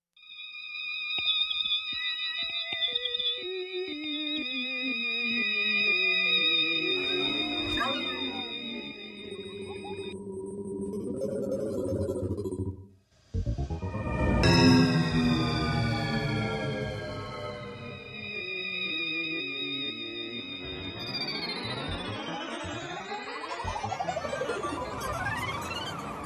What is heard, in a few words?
Scales